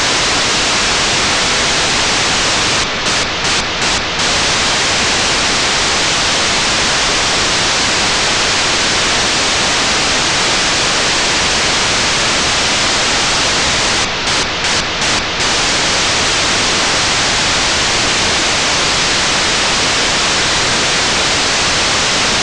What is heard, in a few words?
selfiesat beacon2 mono af